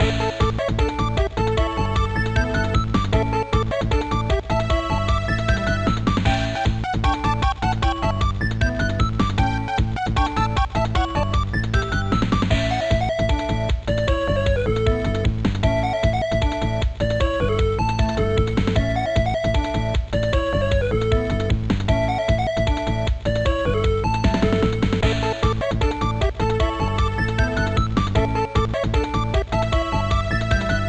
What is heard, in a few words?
BGM; super bust a move; game boy advance